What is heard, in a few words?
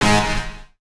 chords